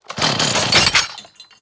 Chain Saw Medium Underlay Death

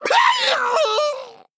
Male Goblin Death

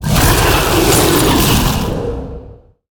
Hailbringer Death

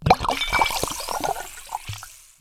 Potion Underlay

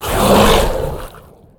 Attack

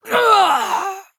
Female Human Death

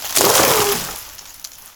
Sapling Attack

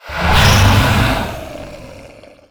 Sated Threshadon, Death

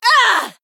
Female Human Death

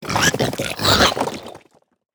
Stone Elemental Play

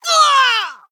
Female Human Death

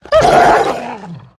Dire Frostwolf Death

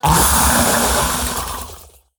Bloated Python Death

